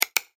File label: multimedia button click; Clicks; McKinney Sound